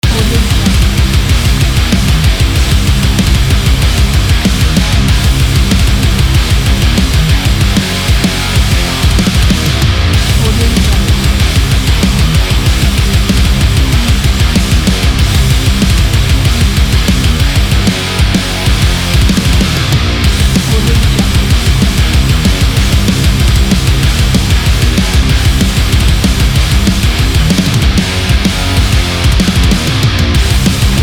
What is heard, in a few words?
metal loop